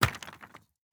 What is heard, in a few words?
masonry break; teardown ps5 windows xbox series xs gamerip 2022